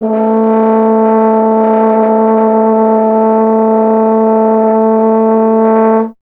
F. Horn; Miroslav Vitous; Solo Instruments VOL-2

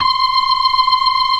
FAST VIB, ORGAN, TREMOLO VIB; Keith Emerson Signature Series

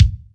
BD, DR BD; Best Service Gigapack 2

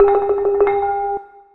XILO, PERC LOOP; USB Soundscan vol.60; AKAI

